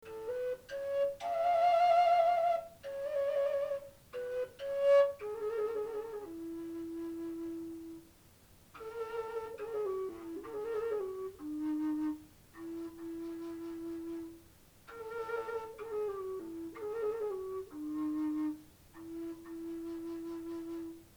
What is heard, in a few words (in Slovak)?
fujara A